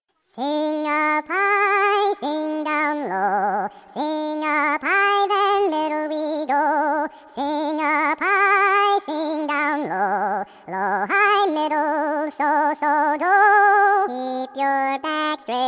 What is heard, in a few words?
Singers Song Trio